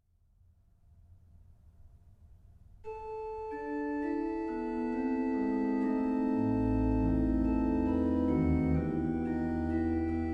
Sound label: Nun komm, der Heiden Heiland, BACH ORGAN, Chorale preludes; Orgelbüchlein